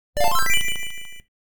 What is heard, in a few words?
Coin Insertion; neo geo 1991; mutation nation; snk